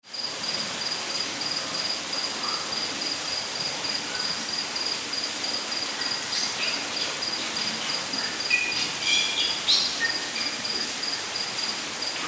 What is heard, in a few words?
rainforest ambience